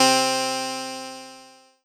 Kawai K3 Harpsichord C3

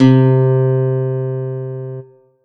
Yamaha CS2x Acoustic Guitar C4

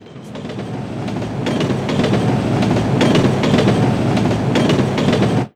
Train; Sound Effects

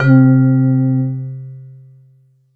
E-Mu Proteus FX Javanese C3

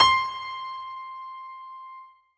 Ensoniq SQ-2 Piano C7

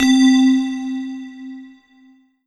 Korg TR Rack Tubular Vibes C4

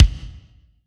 Kick Drum

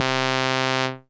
Yamaha PSS-280 Trumpet C3

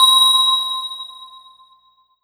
Korg N1R Ice Bell C6

